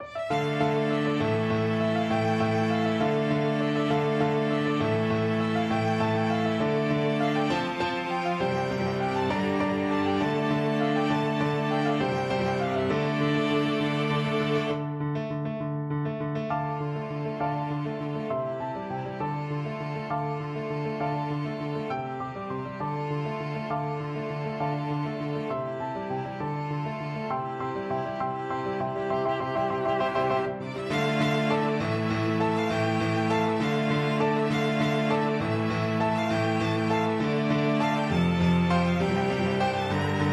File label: piano quartet